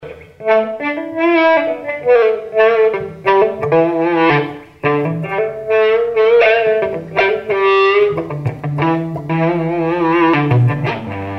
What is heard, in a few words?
Extreme Tilting Harmonic Mode